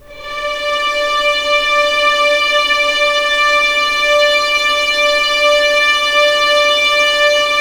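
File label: D5 legato mf violins, left; East West; The Ultimate String Collection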